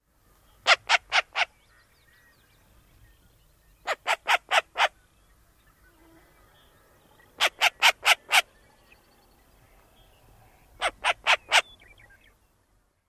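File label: alarm calls near nest, Short-eared Owl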